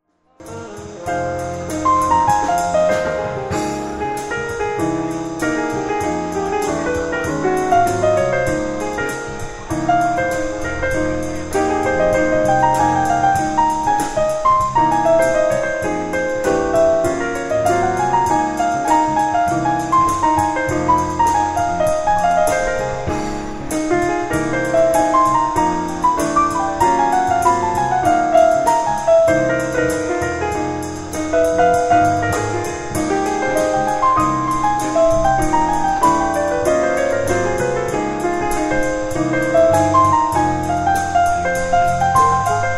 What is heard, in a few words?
piano feature